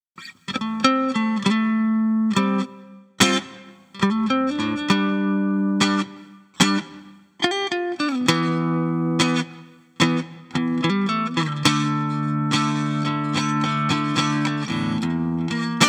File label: Nux MG-30 Singel Coil Twang